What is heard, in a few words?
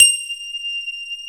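grand piano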